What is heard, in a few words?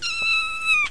mew